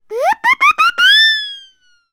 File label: cartoon siren whistle stutter